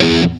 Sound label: METAL SINGL; East West; Global Sounds